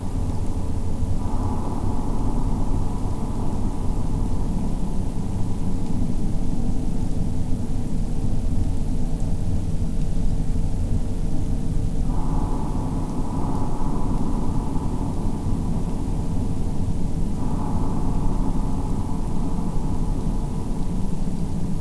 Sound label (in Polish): ambient korytarz; cstrike